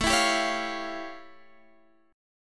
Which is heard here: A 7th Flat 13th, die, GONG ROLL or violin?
A 7th Flat 13th